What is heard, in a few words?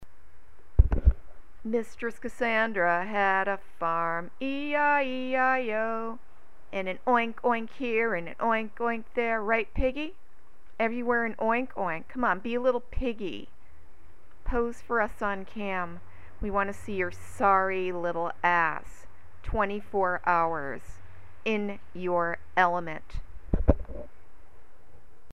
oink oink